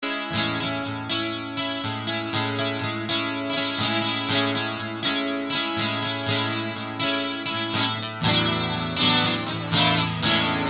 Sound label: Guitar Loop